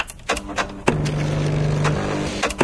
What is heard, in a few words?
combine terminal idle, machines; ambient; Source Engine; video game